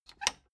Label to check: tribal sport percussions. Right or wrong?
Wrong